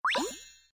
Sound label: mail; animal jam